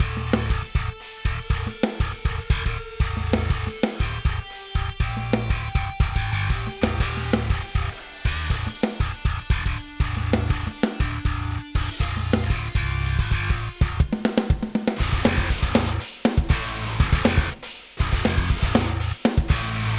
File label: track without reamp; drum re-amping